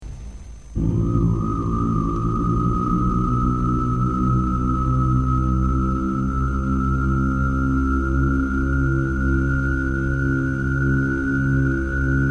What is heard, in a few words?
cave call